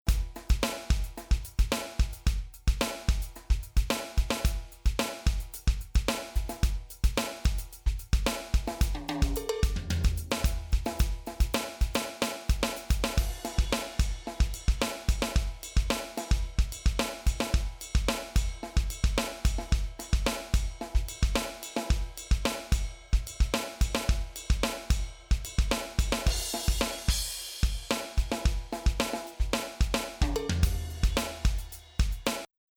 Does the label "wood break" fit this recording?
No